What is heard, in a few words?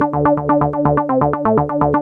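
SEQ RAVE, Trance; AMG; Now CD-ROM (Roland)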